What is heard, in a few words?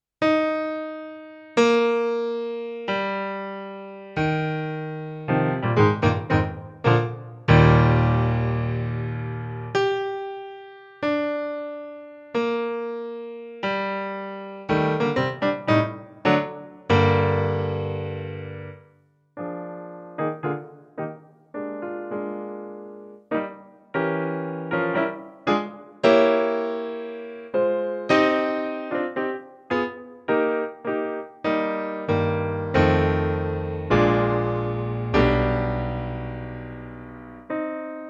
hammond